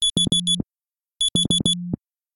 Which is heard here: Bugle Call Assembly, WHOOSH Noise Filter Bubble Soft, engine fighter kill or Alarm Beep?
Alarm Beep